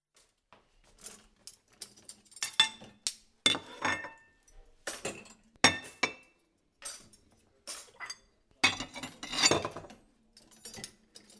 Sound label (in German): Geschirr; bauphysik